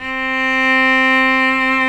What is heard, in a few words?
C3 SOLO CELLO